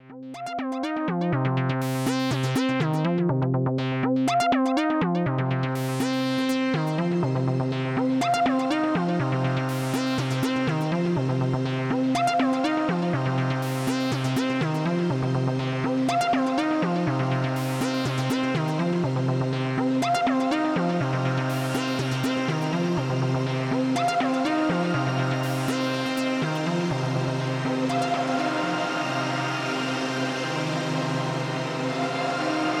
Synthesizer Reverb Shimmer; Immerse MK-II

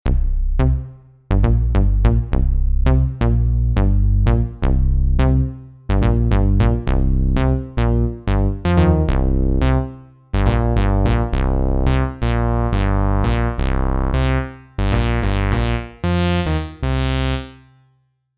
Bass

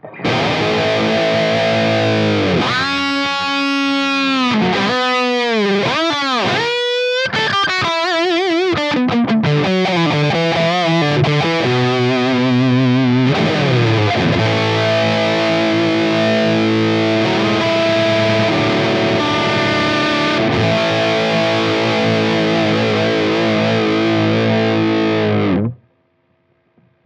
Superfast 100 Lead; Guitar Rig 7

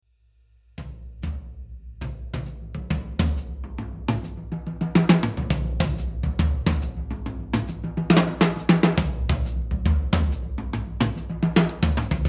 Toms Snares Off

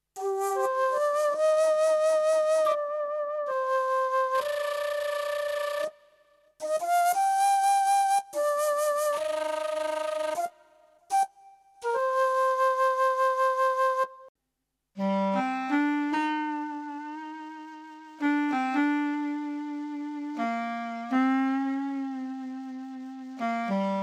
PA600 Sax Woodwind

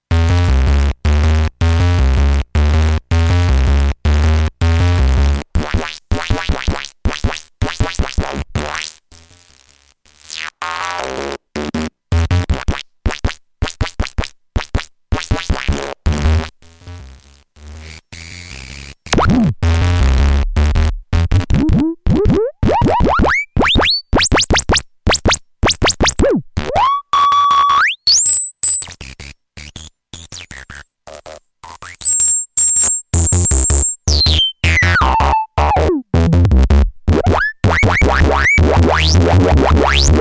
HP Filter; PlasmaVoice

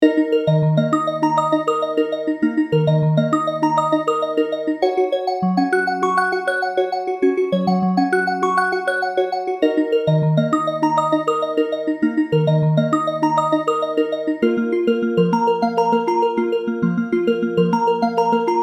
K1 Crystalbell

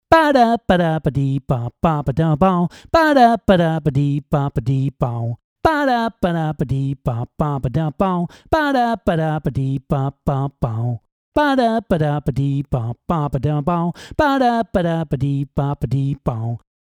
Vox

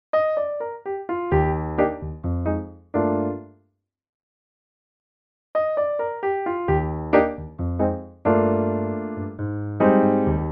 Piano Velvet Grand; Nord

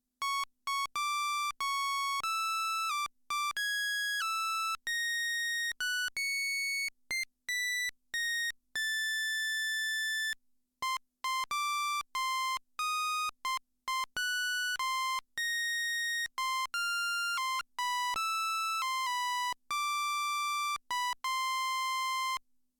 vco stability

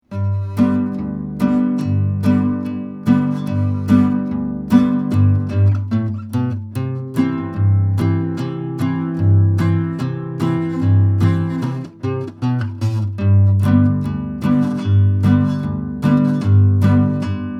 Bass + Chords

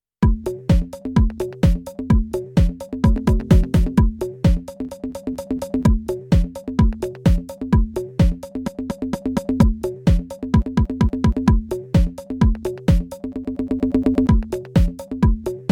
Echo Rev Reverb Minimal

